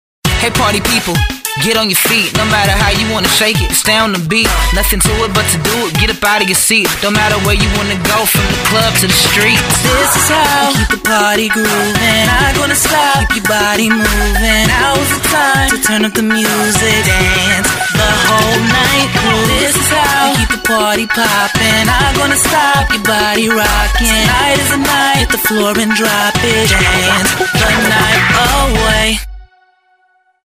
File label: Dance all night; Samsung; GT-C5010 Southeast Asia